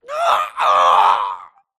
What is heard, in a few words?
grunt death crush; Titanfall 2